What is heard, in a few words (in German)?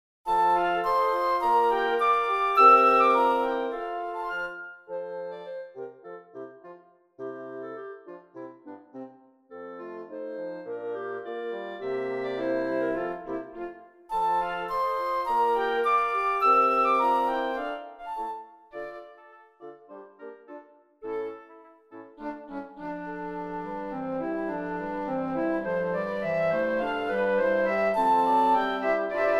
Floete